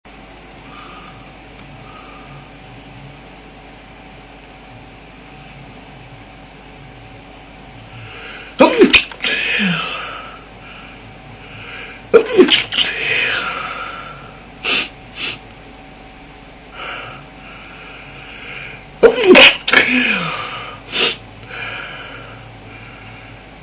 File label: stifles, male